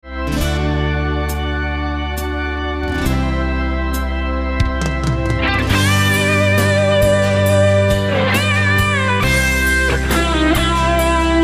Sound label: SOLO, BOSS GT-10